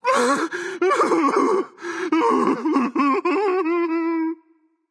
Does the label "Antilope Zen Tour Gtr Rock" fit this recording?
No